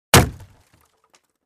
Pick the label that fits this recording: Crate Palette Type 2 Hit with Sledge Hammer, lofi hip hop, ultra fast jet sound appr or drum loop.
Crate Palette Type 2 Hit with Sledge Hammer